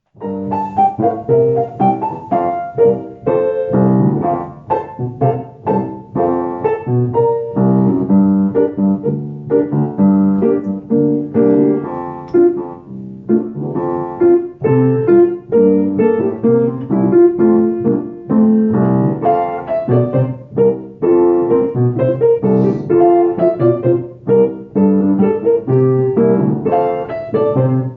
accompaniment

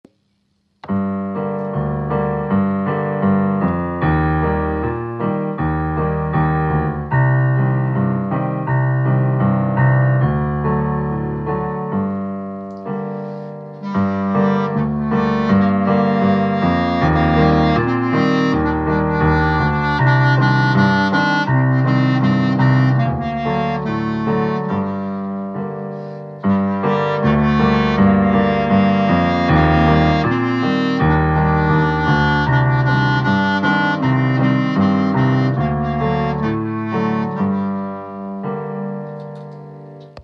Clarinet Sing This Song with Solo

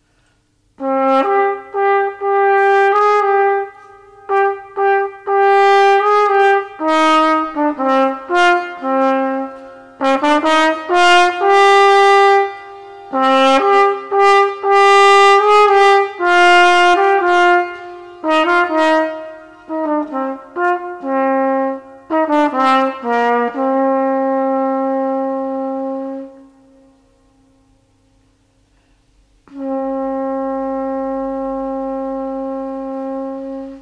Waltz for E Trumpet NO PIANO; Grade 1

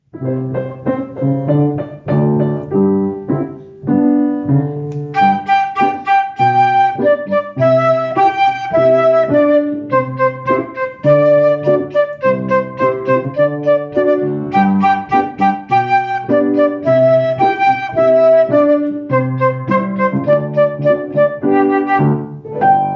with Solo

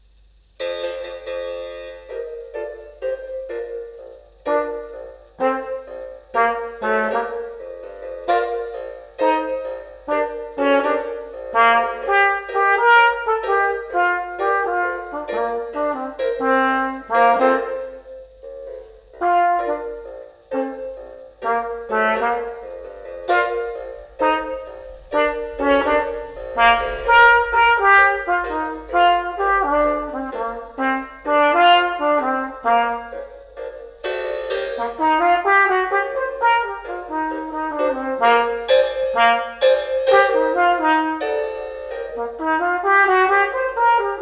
Trumpet

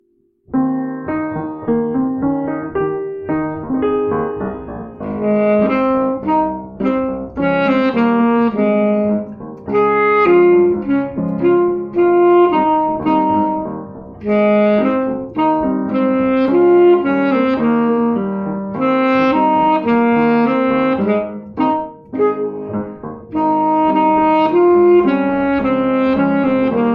Sax

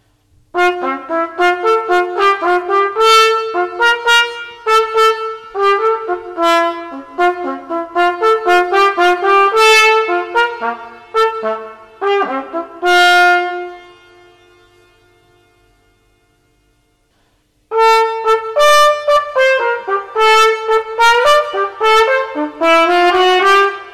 Trumpet Grade 3 NO PIANO

